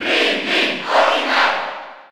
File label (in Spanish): Cheer Spanish PAL; Olimar; SSB4